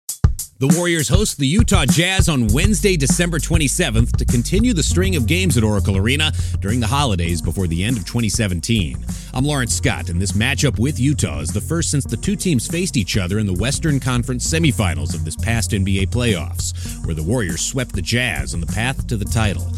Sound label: Jazz